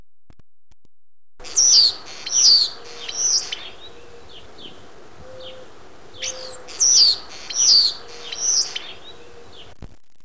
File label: european starling